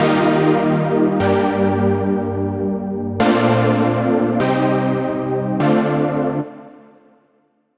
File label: Chords Full Future Bass; midi wizard 2; Unison